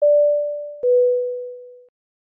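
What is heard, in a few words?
airplane beep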